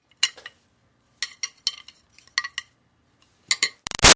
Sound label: Foley Drumsticks Handling Light; Shuffling; Objects; Big Room Sound